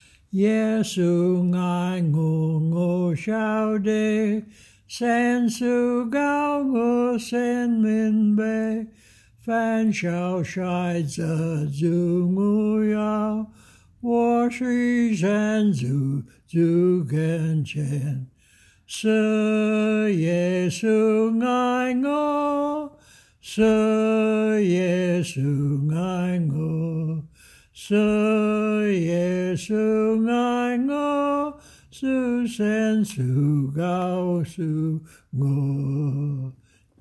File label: singing